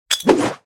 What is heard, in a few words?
grenade throw; Original TK Sounds